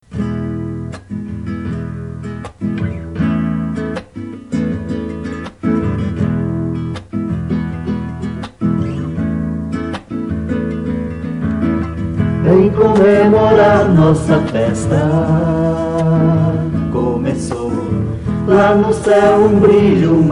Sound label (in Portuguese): PEQUENINO violao e vozes